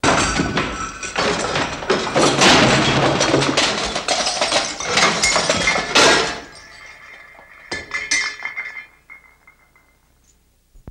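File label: Debris Fall Out Close, ransack room; Debris Falling Out Of Closet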